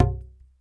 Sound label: LO AFRO WD R, DRM HITS; Spectrasonics; Liquid Grooves; WAVDRM HITS1